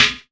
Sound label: SNARE; E-Lab; X Static Goldmine 2